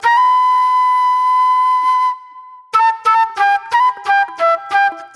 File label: Sharp Delay Flute; Sonic Foundry (Sony Creative Software); Manhattan Flutes; Mixed Berry Jam